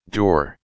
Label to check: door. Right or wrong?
Right